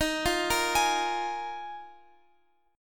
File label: Eb Suspended 2nd Suspended 4th, Guitar Open F; arpeggiated